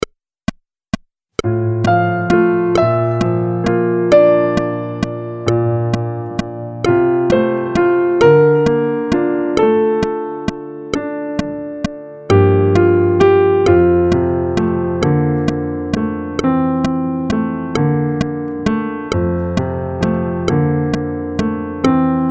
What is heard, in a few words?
dominant soprano